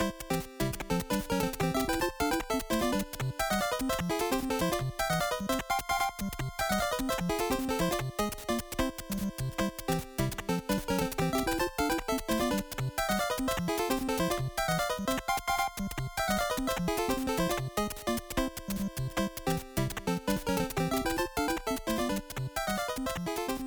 Bonus (Hurry Up!); super mario maker 2; SMB2

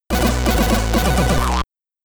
Get Ready! (SID Stereo); Double Sphere; Commodore 64

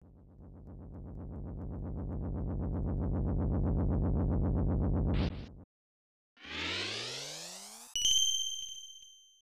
Title Fanfare; Pirates of Dark Water; snes

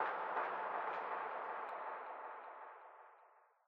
Library Perc

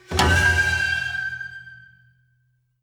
Win (Jingle); labyrinth of zangetsu soundtrack ps4 switch windows gamerip 2022

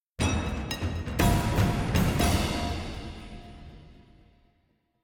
String; Assassin's Creed Unity Dead Kings

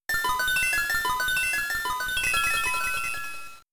Cosmic Power; Pokemon; Gen 3; attack moves; RSE

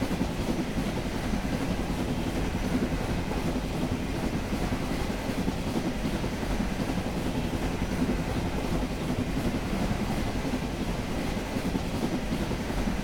TRAIN SOUTH EVE

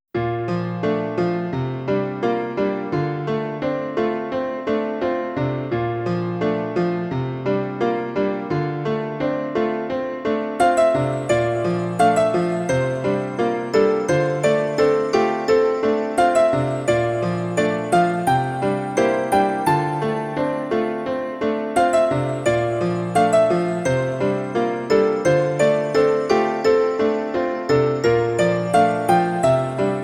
Your Shade -Piano-; D A Black sound collection

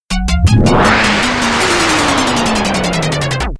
Windtunnel Mystery Mirror Award, pinball; Funhouse; Williams

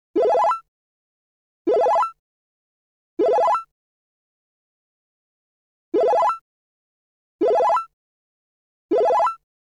Build a House, string quartet

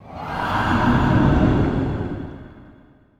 Eerie Haunting Ghost Fade; Lucius III

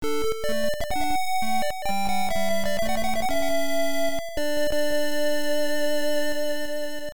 Stage completed; testament msx2 gamerip 1988

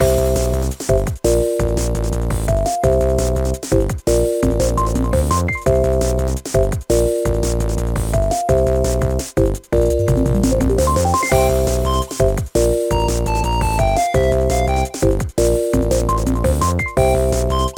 Leaderboards; bomberman ultra live gamerip

